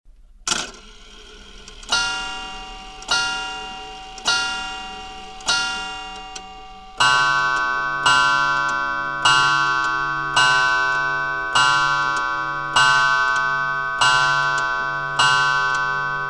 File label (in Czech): odbijeni sloupkovych hodin